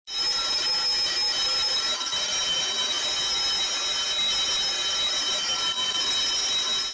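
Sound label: kolokol zvonok, bell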